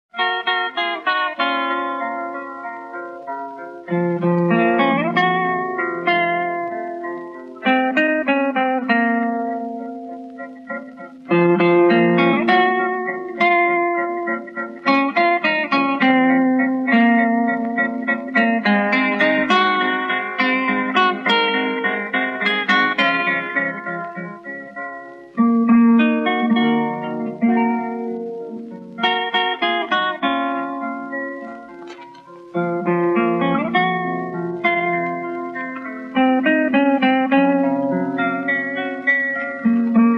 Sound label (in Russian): gitara